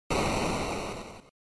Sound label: NES General Noise Single